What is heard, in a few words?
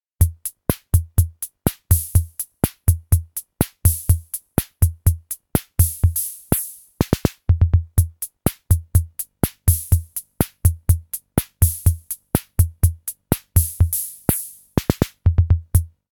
SR-88 PATTERN